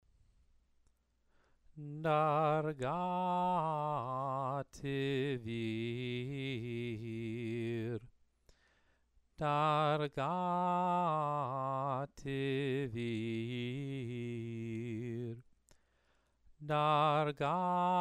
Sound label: Megilat Esther exercise